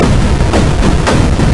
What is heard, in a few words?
storm